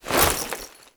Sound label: Bayonet Melee Whoosh Gear Accent; Warframe; Tenno